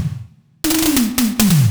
Fills, Loops 140 BPM

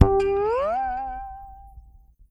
FRETSLIDE, PEDULLA BASS; Masterbits CLIMAX 7 - Bass

